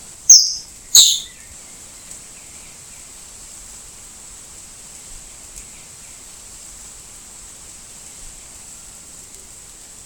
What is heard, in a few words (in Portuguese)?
Cabeca encarnada, Saltarín de Cabeza Roja (Ceratopipra rubrocapilla)